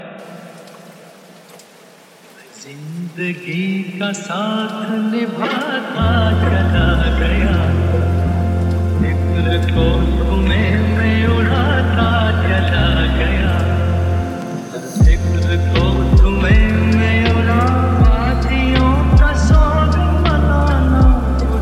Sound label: lofi mix